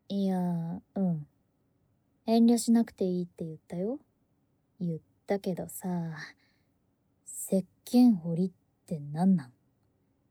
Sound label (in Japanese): 『石鹸彫りASMR』